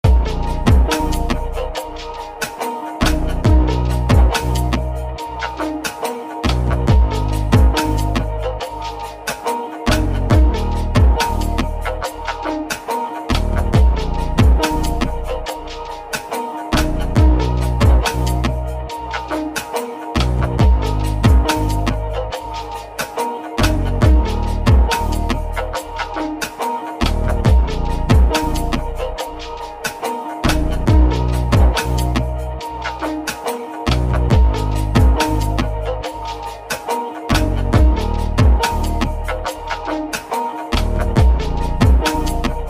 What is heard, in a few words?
Tick Tock; smirksmilegringames